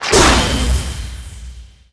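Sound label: attack skill; Kingcall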